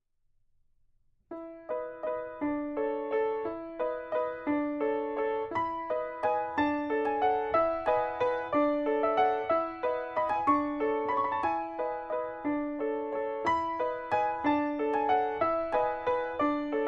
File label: music box waltz